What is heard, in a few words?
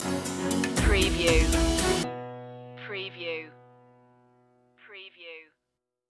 springtime air stinger